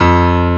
PIANO; sound canvas

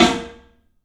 SNAR; E-MU Producer Series Vol. 5 – 3-D Audio Collection; 3D Percussives

